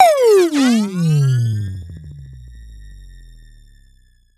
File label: Laser Charge Down; SoundsCrate